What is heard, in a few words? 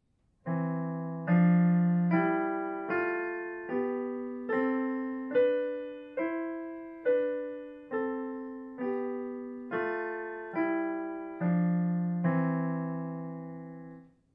Scales in sixths